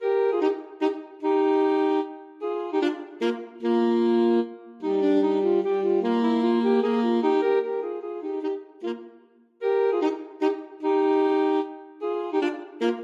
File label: duos menuett, alto saxophone duet